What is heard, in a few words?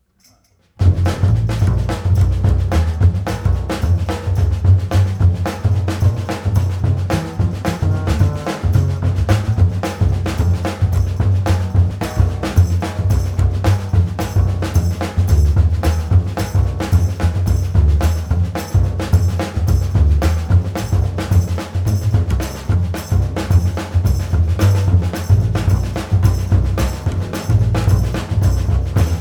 Drum and Bass Groove; finding groove clave; identifying the clave